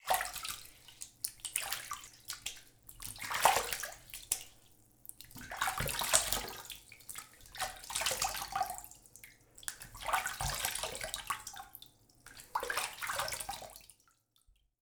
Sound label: Human Male Washes Face In Sink